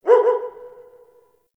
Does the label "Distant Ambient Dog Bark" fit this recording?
Yes